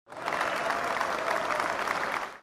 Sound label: clapping male crowd